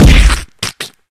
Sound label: Kill Hitsquish; Friday the 13th: Killer Puzzle